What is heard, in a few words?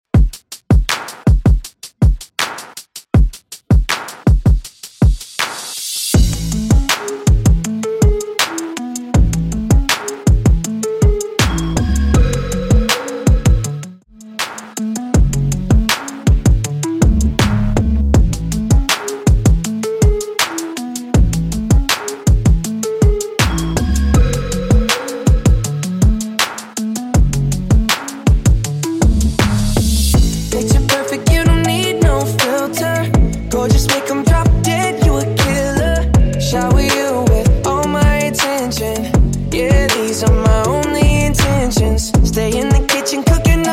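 re drum clean 80 bpm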